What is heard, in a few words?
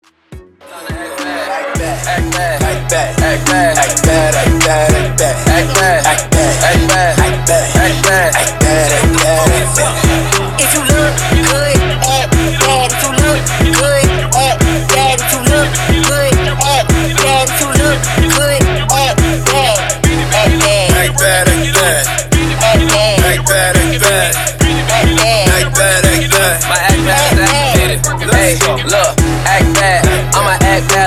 105 BPM; ReDrum Extended Intro Outro